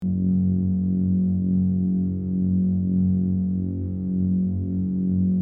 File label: Lightsaber sword steady state loopable humming